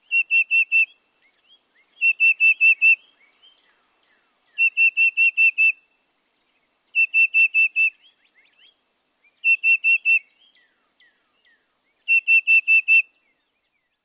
Black crested Titmouse

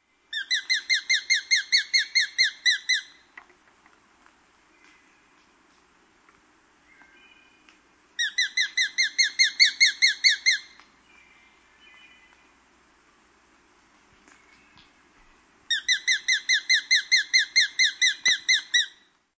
Sharp-shinned Hawk

